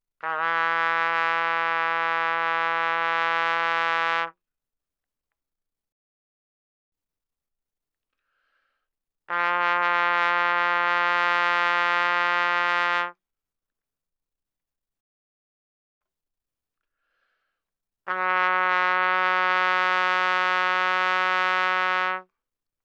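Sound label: Trumpet no vibrato ff E3 B3; Brass; Bbtrumpet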